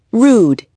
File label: RUDE